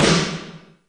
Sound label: Snare, Alesis D4fx; Drums